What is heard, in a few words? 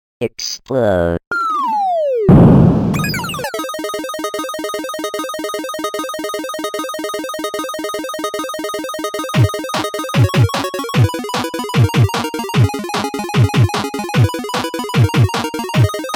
8Bit